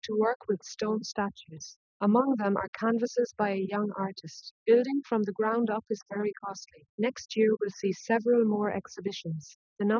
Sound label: female inst; SiSEC10; underdetermined